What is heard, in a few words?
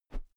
whoosh thick; swooshes-swishes